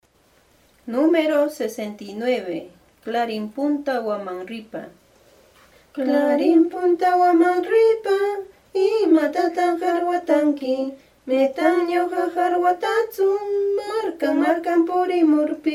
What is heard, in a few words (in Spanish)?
cancion